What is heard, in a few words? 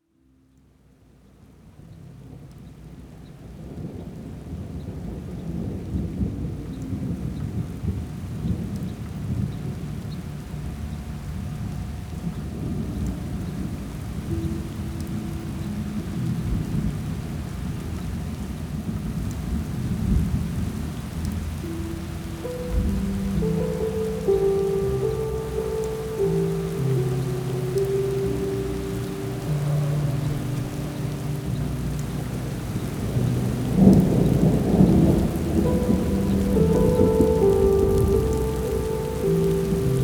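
Zvuk Dozhdia, Shum I Zvuk Dozhdia, Grozy, Livnia, sound of rain, noise and sound of rain, thunderstorm, downpour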